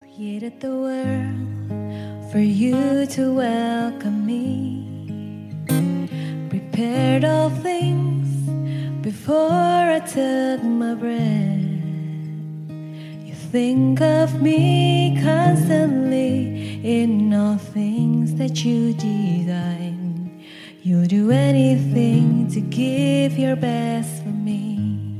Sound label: Acoustic